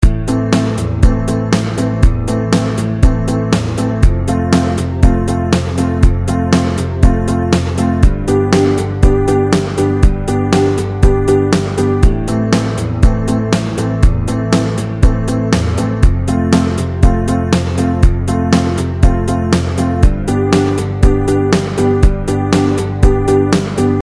jugando con bajo; secretos del ritmo

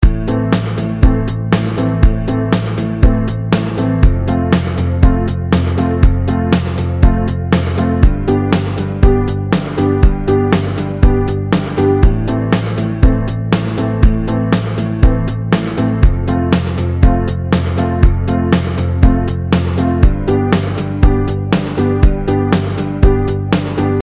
ritmo corcheas; secretos del ritmo

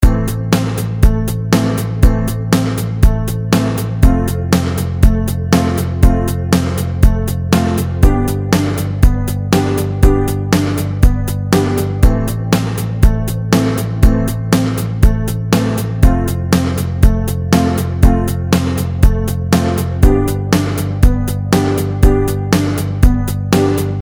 arpegiado; secretos del ritmo

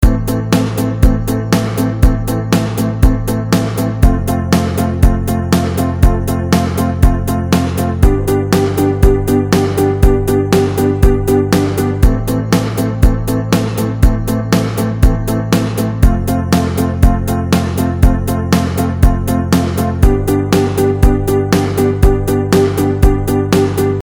staccato; secretos del ritmo

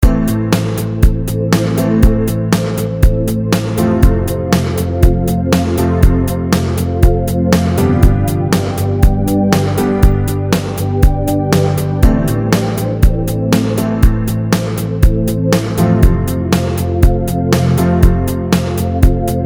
base ritmo a sicopado; secretos del ritmo

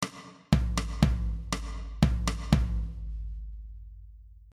ritmo chacarera

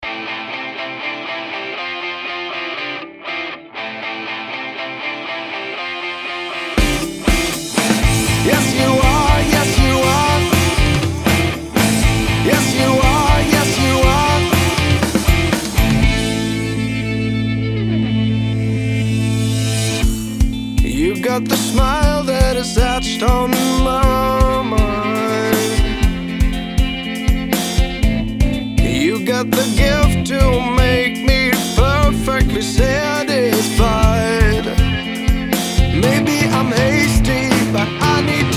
ejemplo rock; music maker

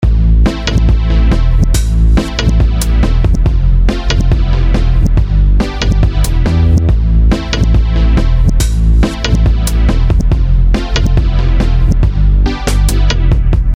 octavas

